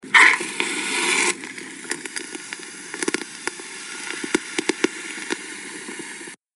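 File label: hookah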